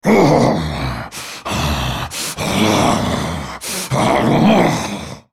spellcast effort; Volibear

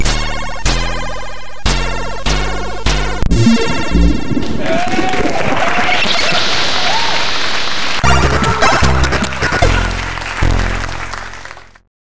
takedown background

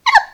burp; suika

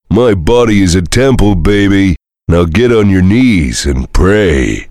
heavy laugh long; tf; duke

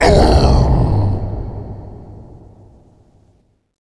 supermutant groan

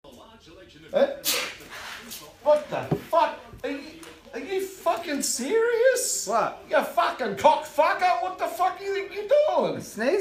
sneeze

